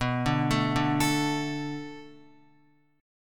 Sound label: B Minor 7th B Guitar, chord; Bm7; arpeggiated; x,2,2,2,2,5; JGuitar